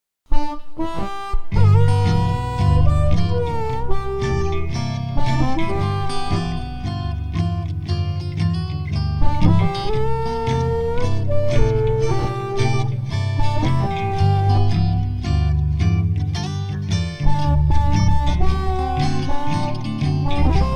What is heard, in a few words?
Blues Harmonica test